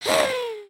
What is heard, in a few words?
indignant, emotes